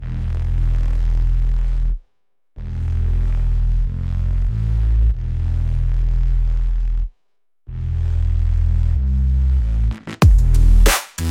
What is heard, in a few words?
colossal destruction bass